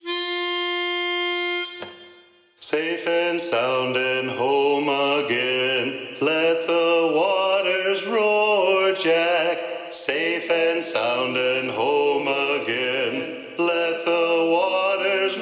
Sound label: Don't Forget Your Old Shipmate (Lead Predomina, sea shanties